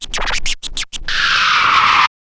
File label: scratch kit, vinyl